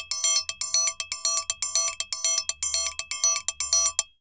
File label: Triangle Samba 120 bpm; Best Service; Festa Latina VOL-1